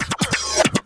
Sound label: Loops Fillin140; Vengeance Samples; VEC1 Fills